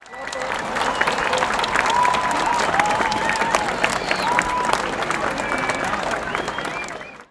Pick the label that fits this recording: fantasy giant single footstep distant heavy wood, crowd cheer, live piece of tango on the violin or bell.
crowd cheer